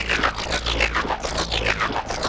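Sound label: Arpeggio Fx, 105bpm; rhythmic inspiration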